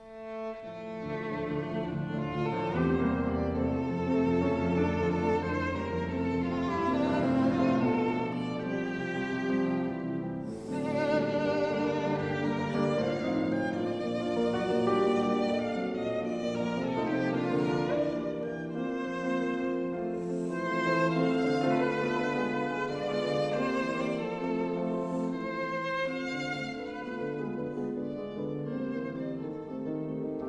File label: Trio No 1 in D minor for Piano Violin and Cello Allegro moderato; Arensky; Op 32